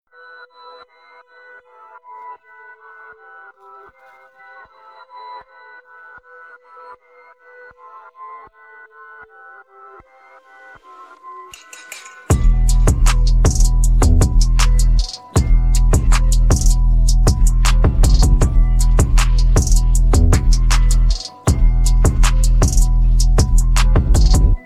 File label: instrumental; persian